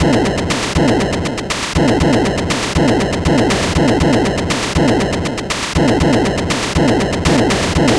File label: Atari 2600 Noise Drums